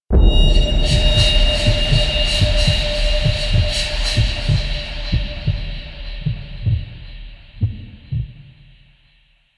Intro Stinger; Cinematics; Warframe; The New War; Zariman

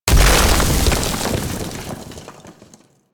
Glacier Ice Barrier Explosion; Ambience; Warframe; Venus Open World

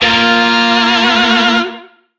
Shawzin Chromatic Chord; Warframe; Lizzie Shawzin

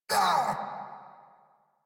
Pain; Warframe; Eidolon Grineer

